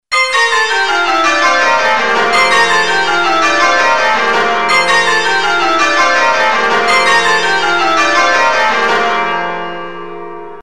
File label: Rounds, changeringing